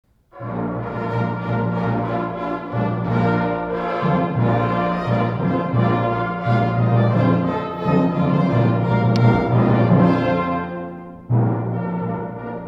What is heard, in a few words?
Fanfare; rote Moschee